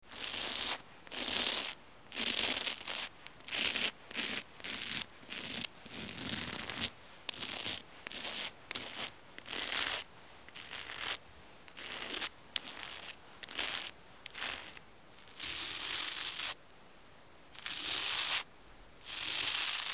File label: Shave Razor